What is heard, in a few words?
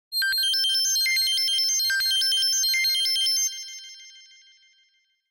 tone, phone tones; Samsung SM-W750V ATIV SE